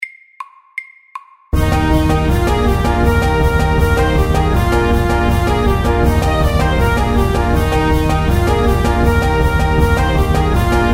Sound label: Cancan FAST 160